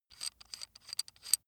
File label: metal screw nut sequence short